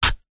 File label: food apple stab with knife; zapsplat